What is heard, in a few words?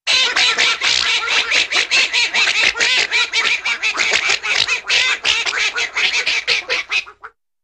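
Group Ducks W busy Quacks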